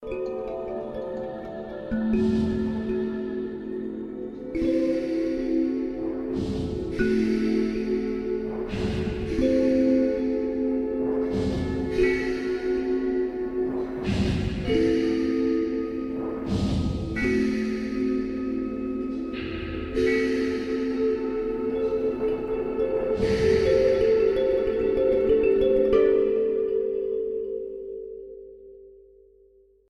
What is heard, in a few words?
Pad